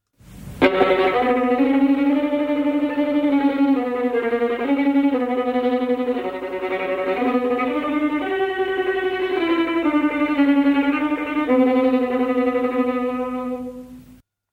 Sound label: tremolo